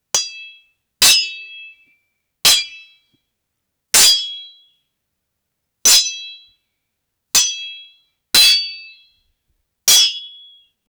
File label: sword fight between two skilled warriors